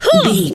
voice female attack

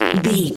fart squirt

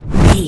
casting charge whoosh buildup

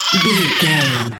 goblin fairy death pain

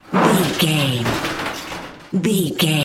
metal sheet impacts

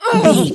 voice female hurt pain intense

